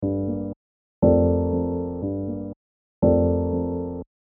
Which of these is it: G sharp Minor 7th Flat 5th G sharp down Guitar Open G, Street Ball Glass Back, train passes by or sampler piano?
sampler piano